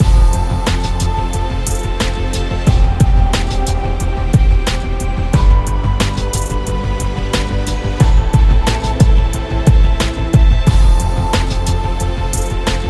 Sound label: Hip Hop Epic; corporation